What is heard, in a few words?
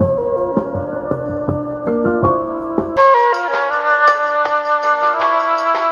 flute